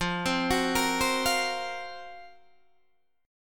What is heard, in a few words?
F sharp Major 7th Flat 5th F